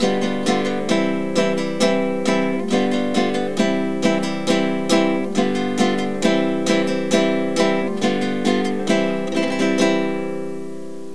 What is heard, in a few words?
sevillanas